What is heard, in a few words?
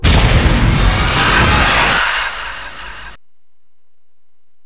scream fade